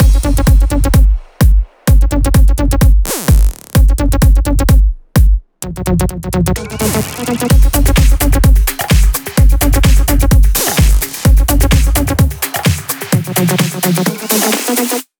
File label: 128BPM Mix; Vengeance Samples; Magnify Kit